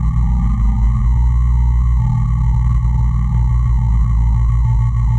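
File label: Ambience Sci-fi Energy Loop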